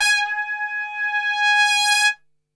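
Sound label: G#2 trumpet, Brass Super Section; Best Service; TRP SWL; 90 s Sample CDs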